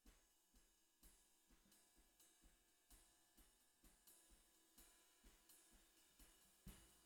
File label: SNR Bot